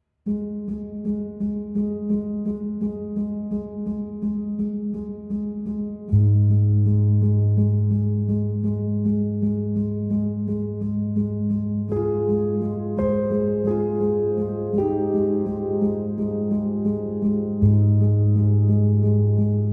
Solo Piano

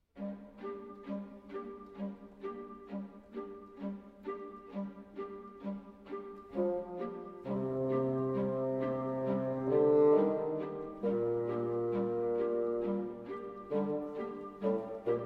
Violin Concerto in D Major, Moderato; Violin Concerto No. 1; Op. 19- III.

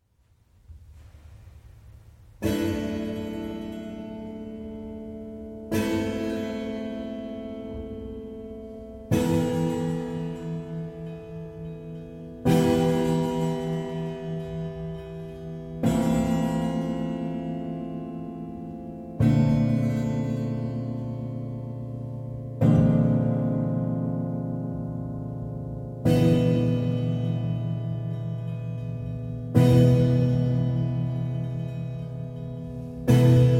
Prepared Piano

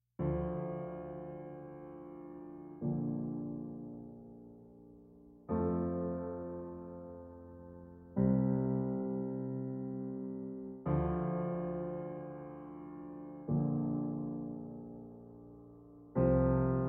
Piano Only

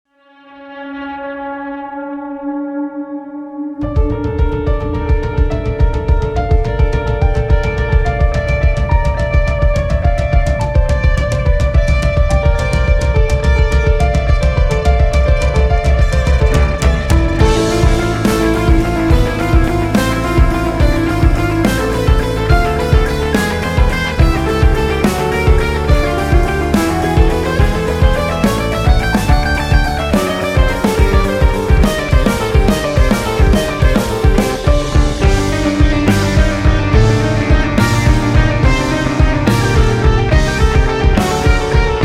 Concerto For Strings in D Minor, Allegro

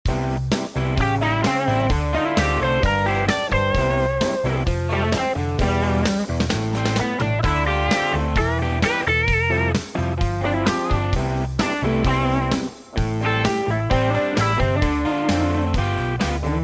Blues & Instrumental Blues

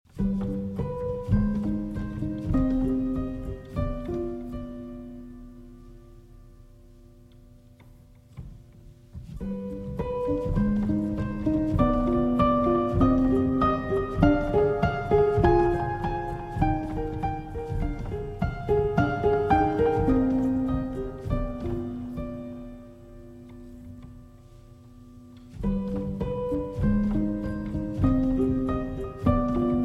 Grand Piano Version

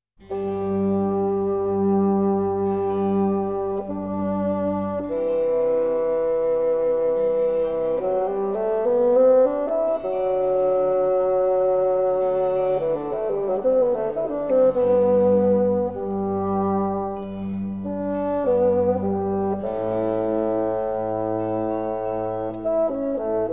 Bassoon Concerto No. 31 in C Major

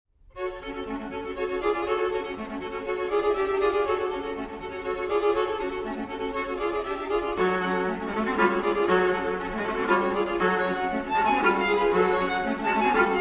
String Quartet; No. 1 Atomizations III. Cosmic Microwave Background

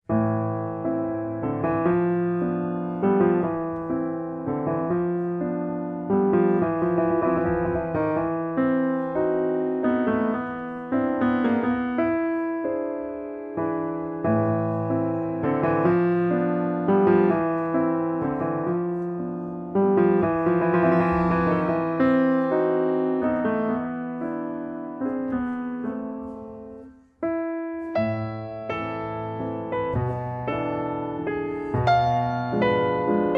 Waltzes, Lento in A Minor

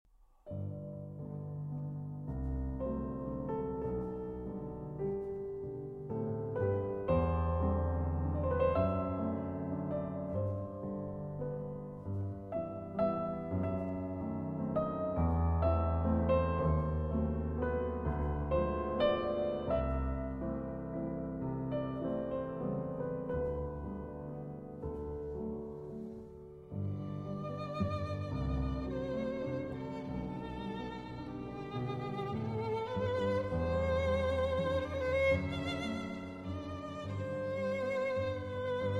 Piano Trio in G Minor

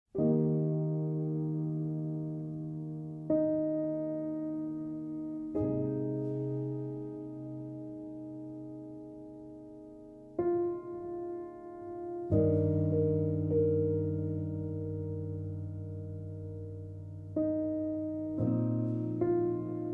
Piano Version

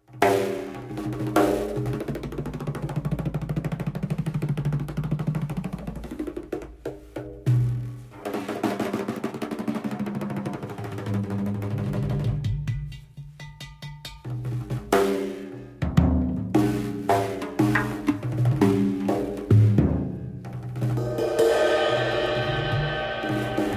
Solo Percussions

